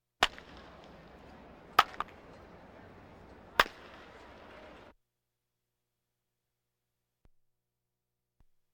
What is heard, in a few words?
Crowds Race cars Baseball